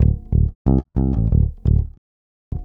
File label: Octaves Groove, Bass Drum Kicks